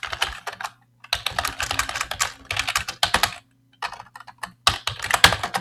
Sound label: Old Keyboard Typing